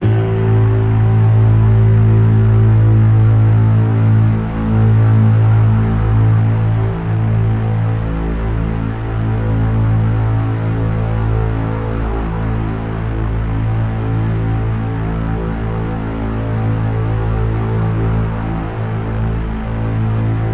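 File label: drone nice buzz A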